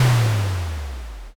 rock crush

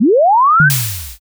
warp jump

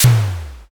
pistol

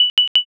timer done

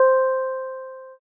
piano

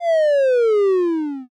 sleep magic

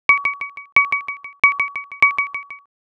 drip

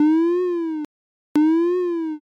warning light

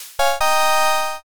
next preview

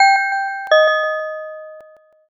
pinpon bell

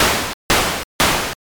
swim

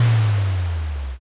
dungeon door

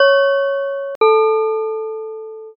pinpon bell

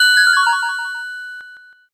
ice magic

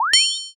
draw sword

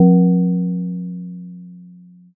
gong

